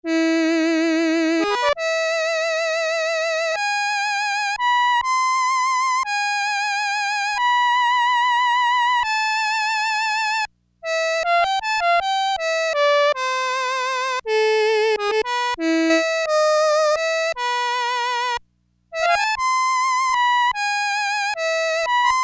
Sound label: String - Violin&Viola&Cello, Crumar Trilogy